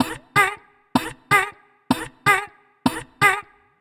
126 G VOCAL LOOP, Funky Underground; Loopmasters; Funky Underground Vol 2

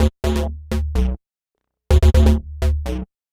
126 D B BASS, Funky; Loopmasters; Funky Underground Vol 2; BASS LOOPS

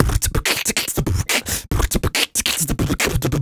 Human Beat Box 140 Pop; Loopmasters

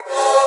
F# VOX STAB; funky; Loopmasters; Funky Underground Vol 2

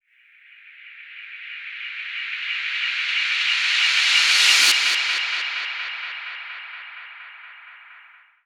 FX Uplifter

